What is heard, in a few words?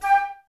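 flute, Woodwinds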